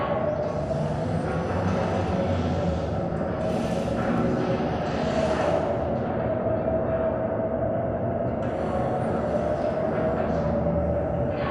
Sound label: Robot Arm Track Slow Dist; Boomtown; Titanfall 2